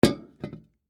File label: Pot Set Down, Household, On, Wood, Full, Small, Metal, Antique; BlastWave